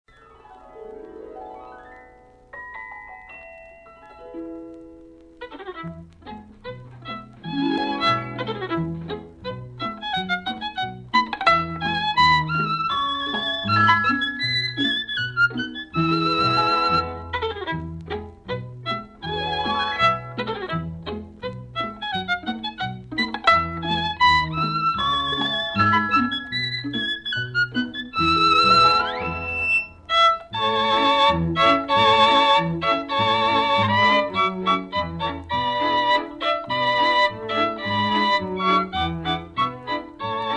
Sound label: Polka Caprice